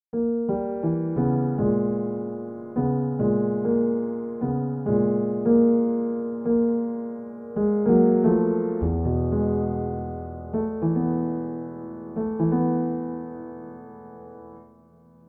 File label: Ravel Jeux D'Eau left hand; Reference; 2015 WASPAA Separate And Restore